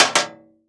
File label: Coin On Metal Tray